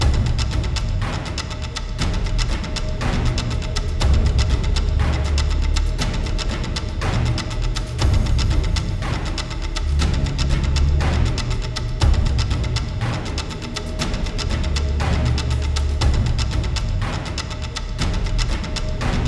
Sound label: battle layer